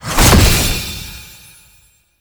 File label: Rune Pick Up; Roathe Brimstone; Warframe; Tau; Abilities